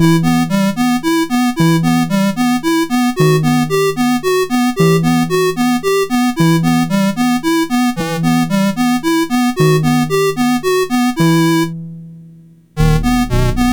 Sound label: Waltz, MOS6581R4